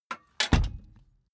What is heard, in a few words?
car backseat turn back